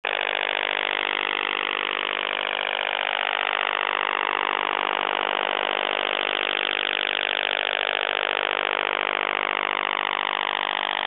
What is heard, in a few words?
PLUTO II USB